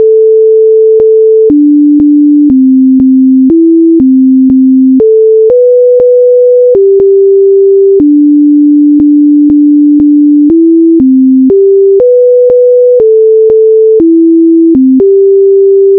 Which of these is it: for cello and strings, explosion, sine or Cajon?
sine